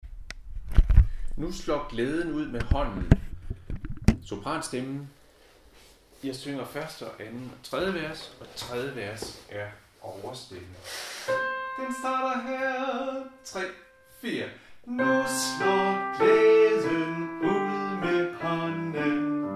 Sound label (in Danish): sopran; Nu slår glæden ud med hånden